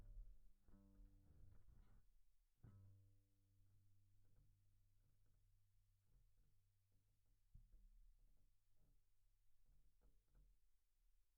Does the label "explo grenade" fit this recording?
No